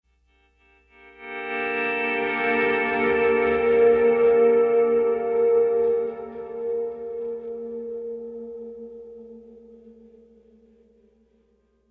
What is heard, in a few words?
Pleasant Guitar; ML Pleasant Guitar Mood 14